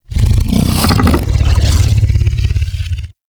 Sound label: Vocal Captured Idle; Warframe; Hunting Critters; Undazoa